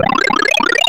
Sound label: Power Up; YI